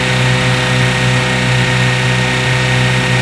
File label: Vaz 08 High